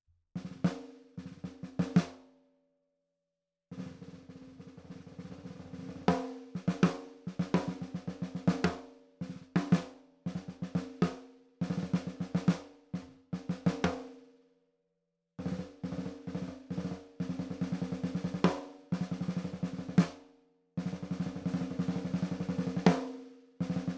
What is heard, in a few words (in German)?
Gretsch Snare Tiefe Stimmung